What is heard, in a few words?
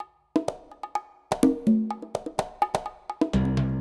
Pirate Loop